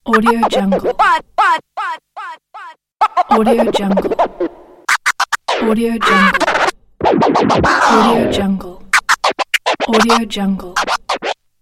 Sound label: record scratch sound effect pack; sweetmusicproduction